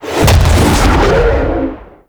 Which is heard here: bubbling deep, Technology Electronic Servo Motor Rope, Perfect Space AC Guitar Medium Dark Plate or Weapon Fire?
Weapon Fire